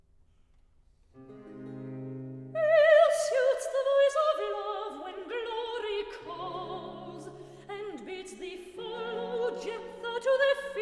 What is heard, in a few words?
Recitative; Act I; Iphis; Ill suits the voice of love; Klassika; Handel Masterworks